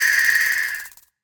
death, Flying Insects

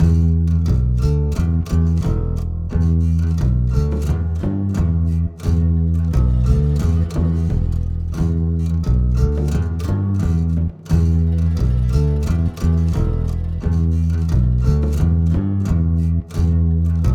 drumulus singalongus C

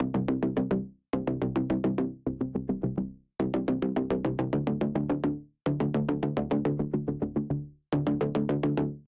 Tension Bass

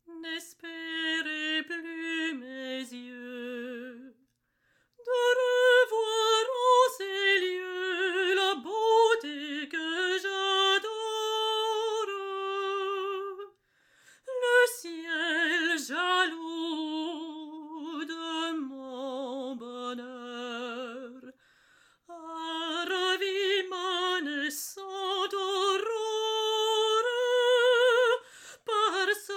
Solo Women; Assassin's Creed Unity

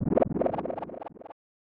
Bubble

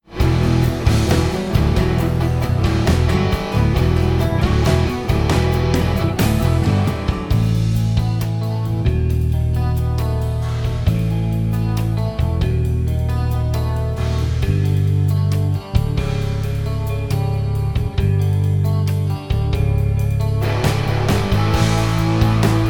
rock loop; heroes of the storm full gamerip 2015; starcraft terran1 part4

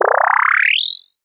Charge; Chaser

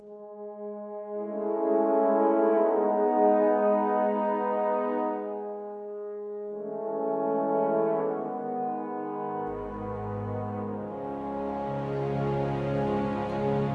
Level End Transition

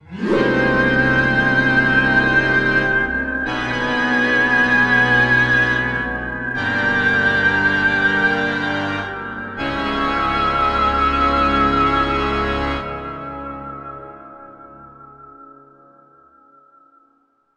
Sitar

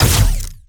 Gunauto Plasmid Machinegun Single

